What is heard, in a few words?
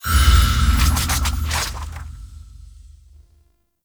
Selected Title A